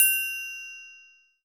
triangle, percussion

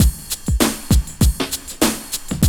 Loop, Roland; Sound & Vision - Gigapack I

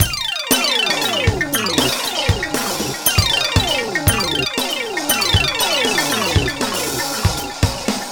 Gliss 2fer Full, lounge, 118

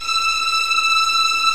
TREM VIOLINS

